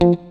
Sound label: STRAT, GUITARS; Synclavier Sampler Library